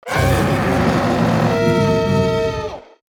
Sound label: Large beast monster pain hit death; fantasy